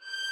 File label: Violin o5 G G